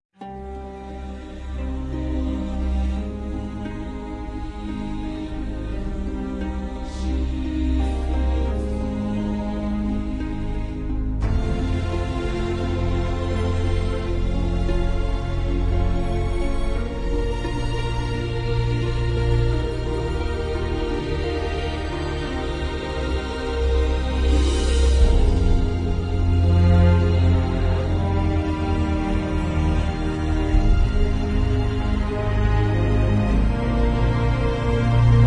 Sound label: map music; Bloodrealm Battlegrounds